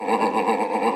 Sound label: orangutan